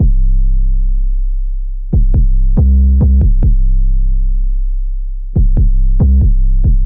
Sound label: 808 Bass C 70 bpm; Drift